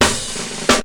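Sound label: snare roll; BEAT2000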